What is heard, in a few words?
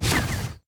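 dragons enemy shadow basic cloak; sfx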